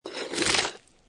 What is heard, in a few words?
Snow And Ice Ice Scrape Small; Ice (Sound Effects:Weather)